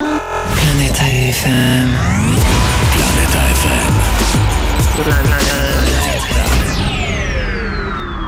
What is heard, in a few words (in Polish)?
Jingiel